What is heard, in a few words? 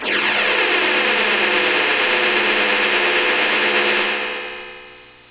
warp; ff6